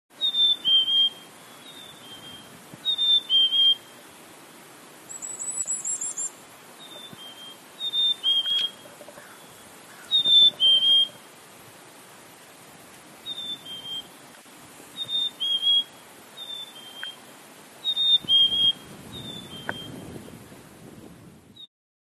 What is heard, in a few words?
Poecile atricapillus - Black capped Chickadee